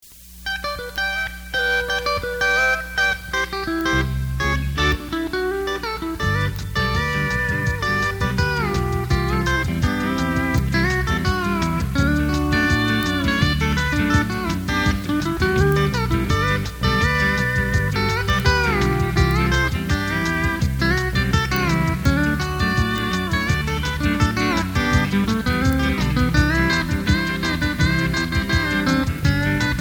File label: Steel Guitar, Steel Talk Rag